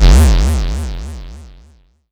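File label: attack whip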